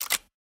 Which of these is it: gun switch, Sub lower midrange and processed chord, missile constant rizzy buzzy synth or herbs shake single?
gun switch